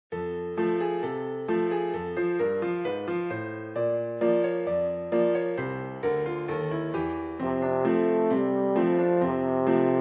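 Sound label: Jingle Bells, Horn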